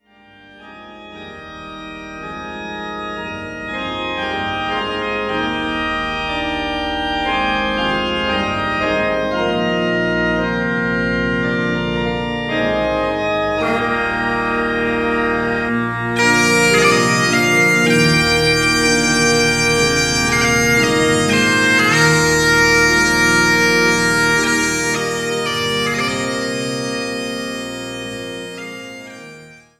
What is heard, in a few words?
concert